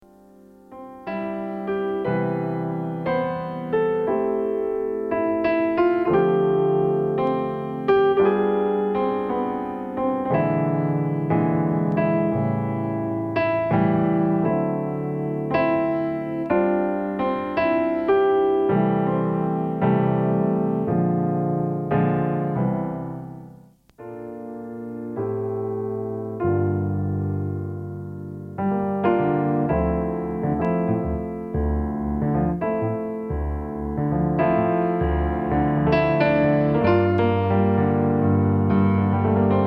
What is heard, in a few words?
soft jazz